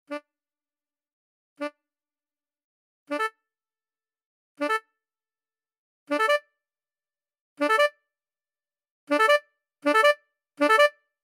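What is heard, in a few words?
Classical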